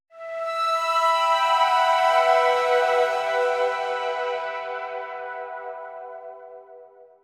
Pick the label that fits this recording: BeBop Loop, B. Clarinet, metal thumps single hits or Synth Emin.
Synth Emin